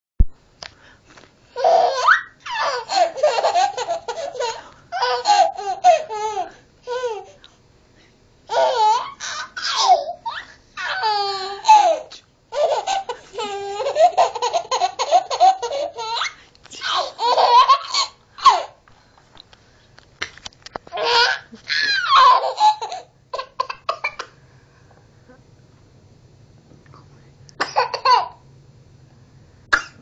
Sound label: sound baby laughter